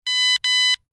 System alert beeps

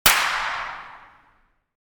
Pistol shot distant perspective

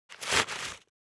Garbage bag rustling handling

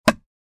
Wood panel impact

